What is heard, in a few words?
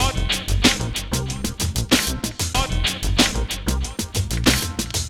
Hiphop (Jump Up), ringtones; Google; NewWave Labs